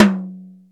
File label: R-8 dazzling tom, Dry